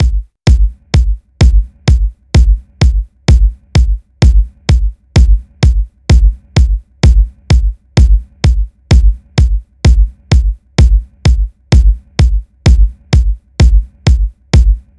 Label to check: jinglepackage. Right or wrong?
Wrong